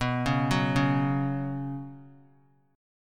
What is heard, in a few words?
B Suspended 2nd, chord; arpeggiated; JGuitar